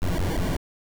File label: hit; SpaceForce